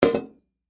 Teapot Set Down, Household, Teapot, Set, Down, On, Wood, Full, Metal, Antique; Kitchen; BlastWave